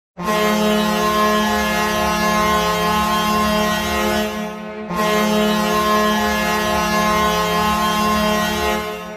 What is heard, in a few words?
goal horn; coyotes